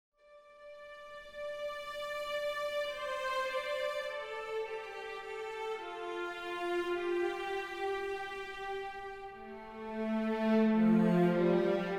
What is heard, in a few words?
travel neutral; Mount&Blade Warband